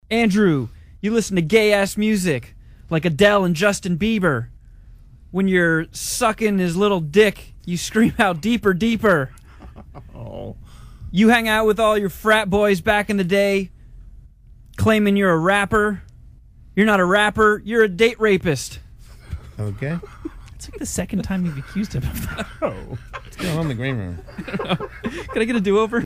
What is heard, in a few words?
rap, dry